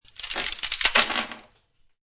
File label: Ice Cubes Twist Ice Cube Tray Fall Onto Kitchen Worktop